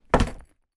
foley suitcase place down on office desk

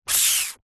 human suck air through closed lips mouth

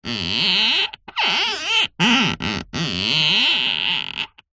string rub wood squeak; foley

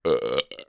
human male burp with tail

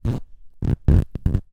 human feet skin rub hard squeak

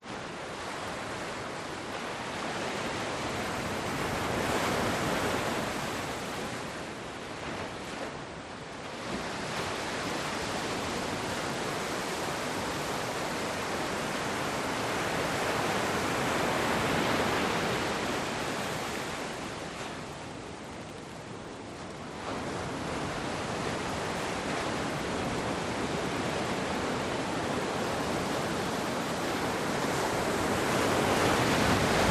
cove night waves break water wind beach; Alexander Gastrell

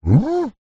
cartoon dog confused huh vocalisation; zapsplat

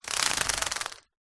household blind venetian raise; zapsplat

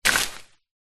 foley bush small dense hit with wood post

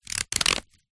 foley plastic bag very thin rub hand squeak; zapsplat

